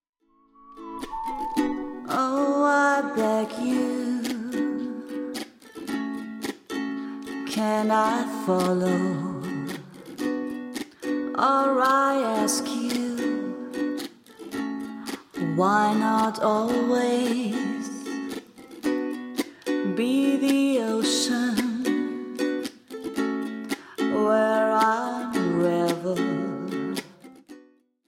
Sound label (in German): ukulele gesang